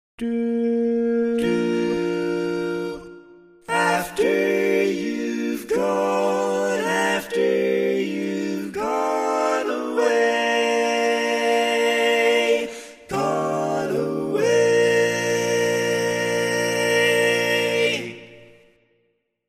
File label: Tenor, barbershop